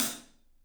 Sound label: ZILDJIAN HT; 3D Sound; 3D Drums